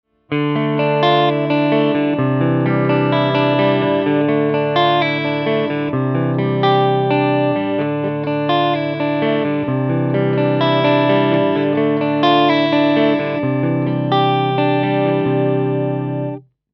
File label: Marvel Clean